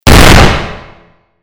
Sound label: explode; jihad